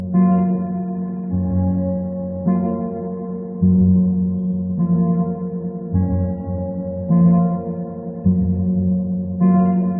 sad music

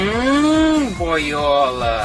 hum boiola

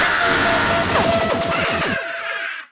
jumpscare sound; jollibee

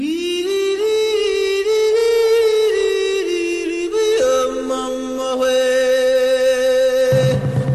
funny song; lion king

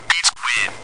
geese win

